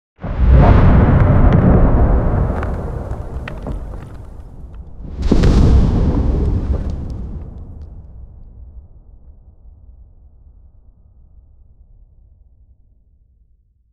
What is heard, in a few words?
dragon landing on rocky m; vfx